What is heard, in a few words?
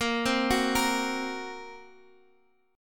A sharp Suspended 2nd Sharp 5th A sharp, Guitar Open G